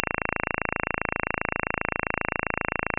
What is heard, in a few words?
27.5625Hz Incomplete Buzz